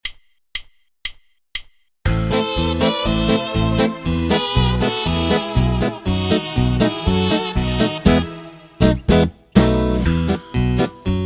base, paso doble

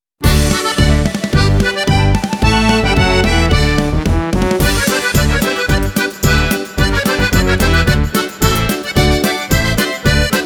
polka oberkrainer

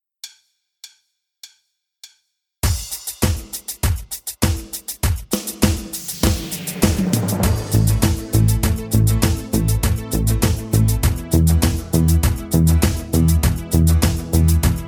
cumbia, base

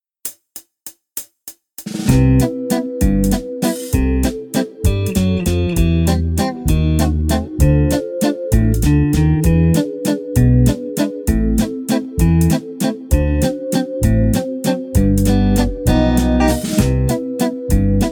valzer musette

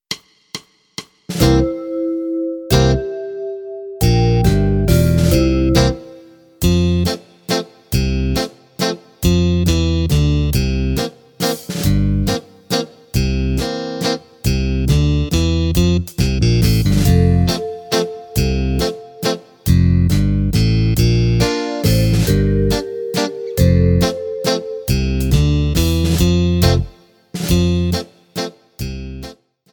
Mazurka

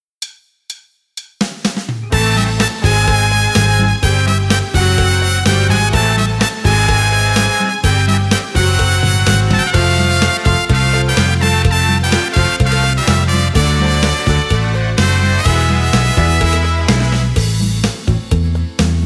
cha cha cha, base

